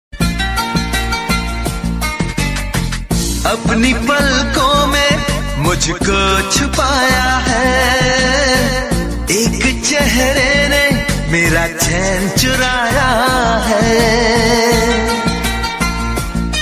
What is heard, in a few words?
Love Song Ringtone